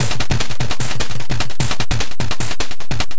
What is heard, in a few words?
Beat, Industrial